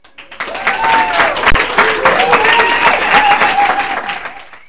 Claps; LG; U8180; EMS Tones

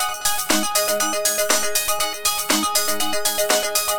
ringtone, electro; Google; NewWave Labs

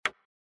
dice; LG LU9000 Arena; Dice Game sounds

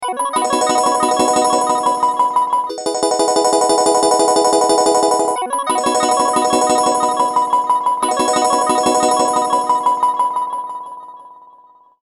Emerald, ringtones, HTC One M7

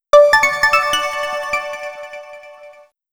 startup

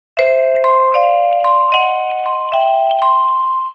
music fun vib, notifications; Samsung GT-i7680; cmcc

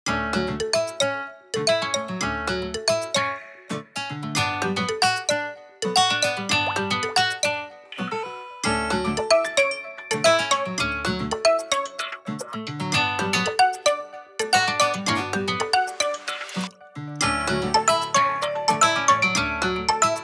Waterdrops; Motorola V14W; Rising Day

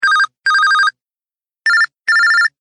Move Bell; KV510; Simple

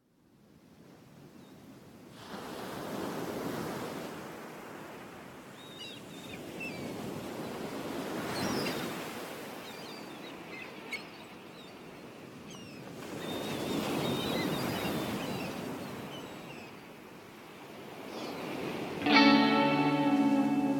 beach breeze; Google; Google Sounds; Seasonal Celebrations; alarms

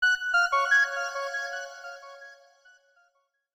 Birdsong

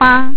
key; ZTE; R90; Keyboard

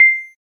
cam start, phonetones, LG; LG Wine Smart F480K

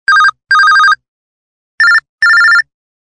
Telephone; GM630

